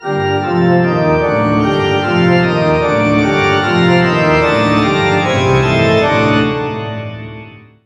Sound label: Haunted Towers Organ; game rip; luigis mansion dark moon; 3ds switch